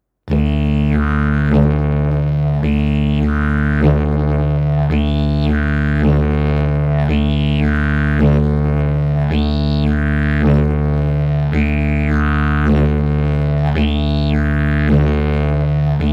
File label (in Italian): ritmo livello principiante